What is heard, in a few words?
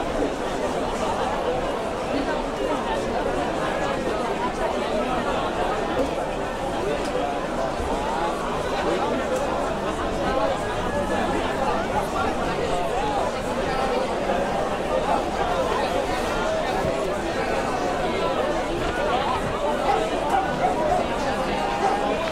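noise of people, old town; skachat zvuki starogo goroda; stg shum liudey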